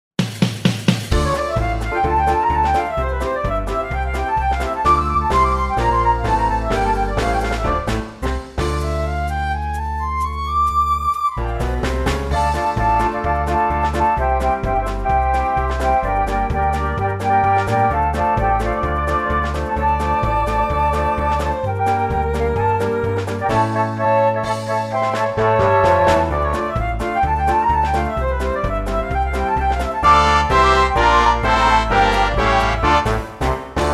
FLUTE

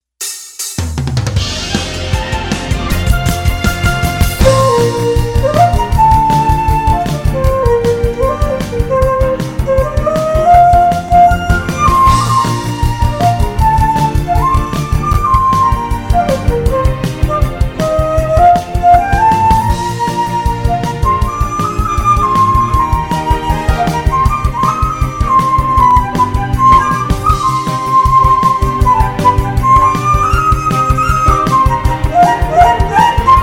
Flute

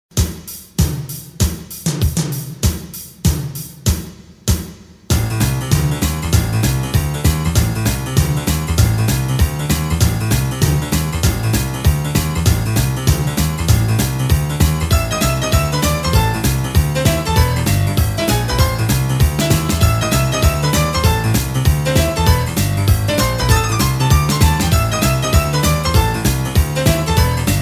rock and roll; Dance-Jazz/Tap Tracks

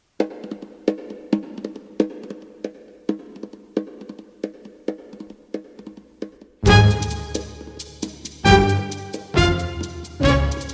Brazilian Tango dance

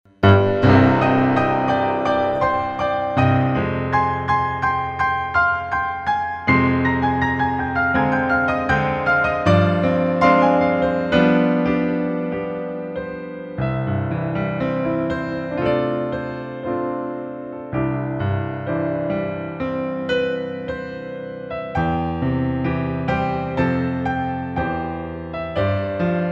Piano solo

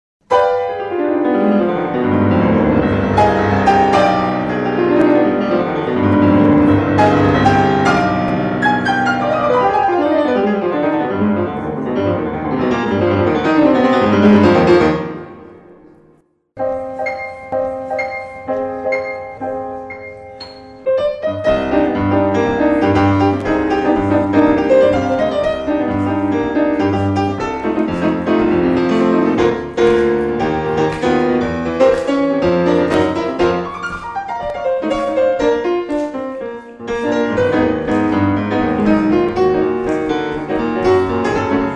Chopin Etude with Jazz interlude

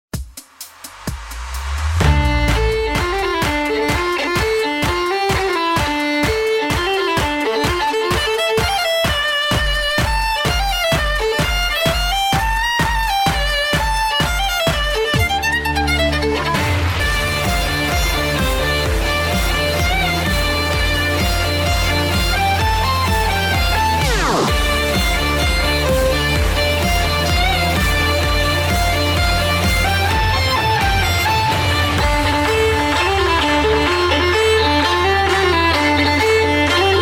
Violin

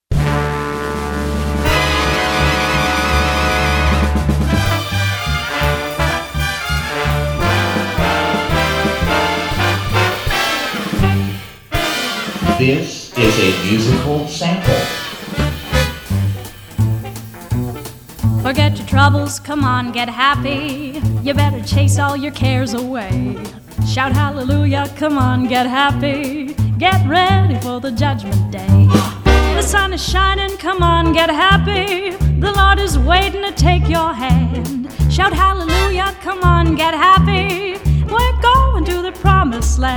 Tap Jazz; sound alike tracks

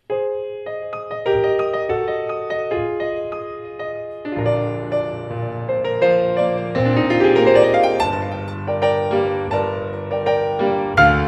PIANO

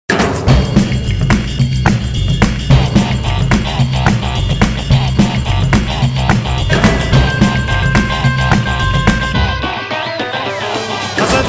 Bossa Nova dance

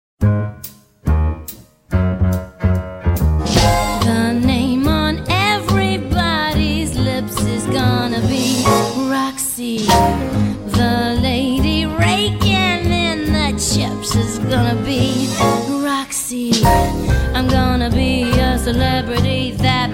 VOCAL

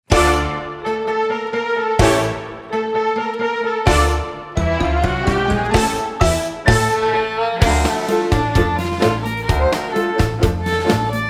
violin

